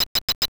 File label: shutter; ub megaman